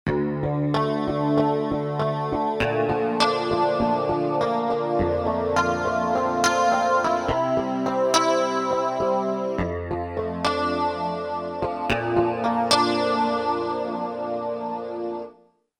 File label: JC Strat, Roland JV-880; Factory Patches